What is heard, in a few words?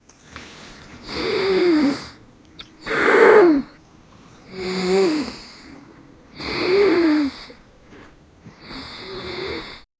sneezing panda; domestic animal